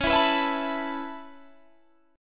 C sharp Minor 7th Sharp 5th C sharp Guitar Standard; C#m7#5; strummed; JGuitar